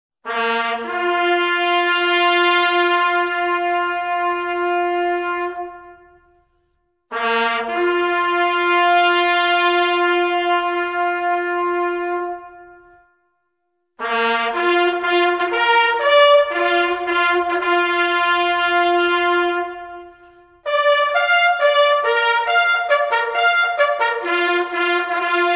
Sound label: lastpost